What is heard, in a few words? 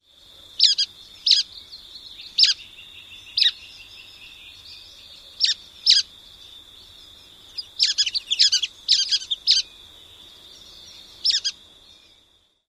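BROWN-HEADED NUTHATCH calls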